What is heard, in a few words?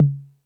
Beat Box, E-MU Pro-Cussion; drum machine